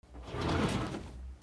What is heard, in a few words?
OFFICE